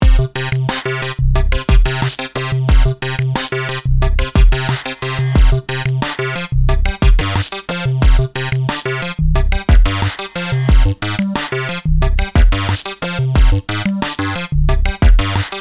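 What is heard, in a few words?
adjust pitches drums, sequencer, C minor; Numerology; Five12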